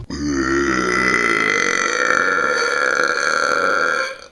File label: burp